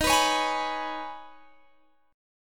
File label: Eb 7th Suspended 4th Sharp 5th Eb, Guitar Drop D; x,18,21,18,21,19; down